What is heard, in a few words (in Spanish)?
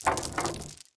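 CADENAS DRAG, chains drag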